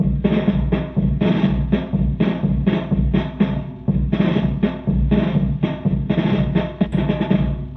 Chamberlin Rhythmate Loop, Drum Machines; Chamberlin Rhythmate Sample Pack; 300 Drum Machines

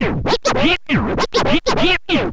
scratch short, Vinyl scratches

